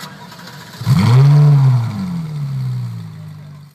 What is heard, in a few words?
startup, ferrari 458; vehicles